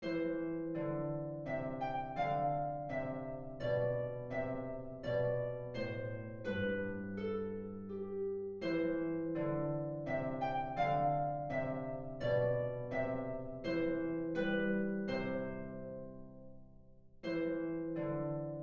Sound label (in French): menuet majeur; bach johann christian